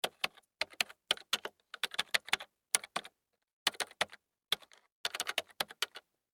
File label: Computer Keyboard Typing; Technology; Offices